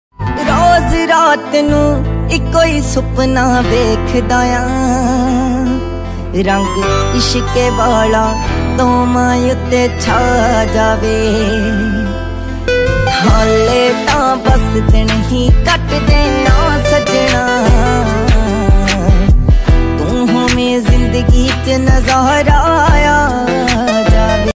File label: Punjabi Ringtone